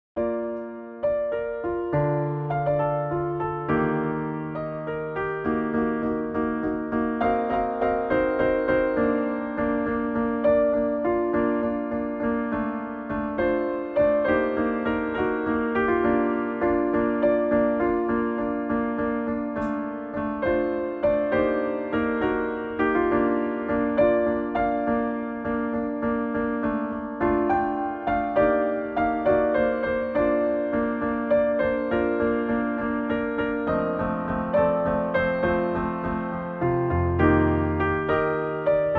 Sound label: piano